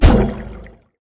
swing trunk; smite; god ganesha skin11

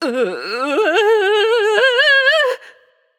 fear; Smite; athena

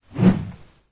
Staff Swing; smite; kumbhakarna

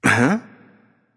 confused; smite; loki

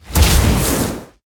cast fire; smite; god merlin

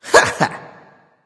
grunt jump; smite; loki

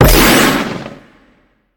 jump; Smite; Xbalanque

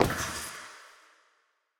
Footstep; Smite; god Izanami

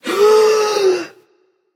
breath passion; chaac

